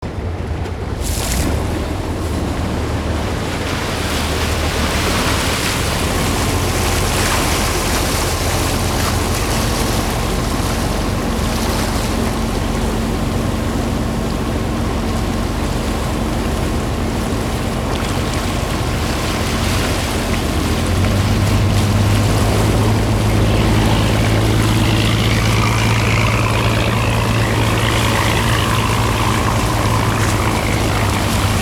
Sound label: boat ferry start drive; best service Blue Box; sea traffic